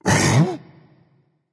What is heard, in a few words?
confused, berserker; cuchulainn